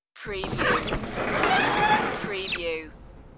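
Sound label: Slide Door Open Slow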